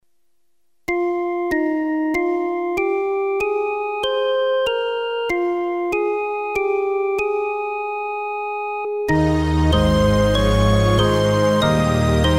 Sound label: instrumental; Ó deus e pai